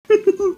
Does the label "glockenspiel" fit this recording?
No